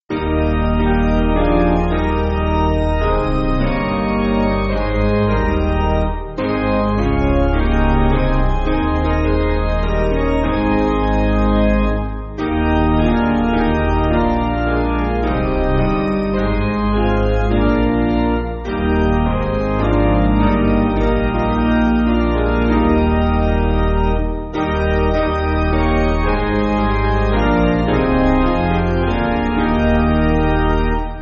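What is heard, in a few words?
Piano, Music Backing Files for Churches; Dismiss Me Not; Brunswick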